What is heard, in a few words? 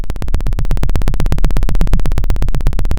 pop, Sound of a helicopter